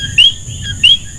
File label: coqui